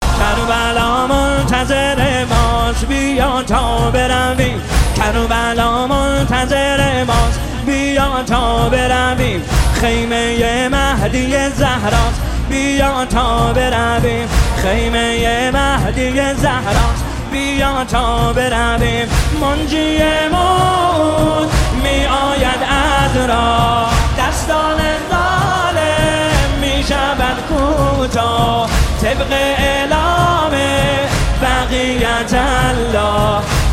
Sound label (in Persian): مداحی; احساسی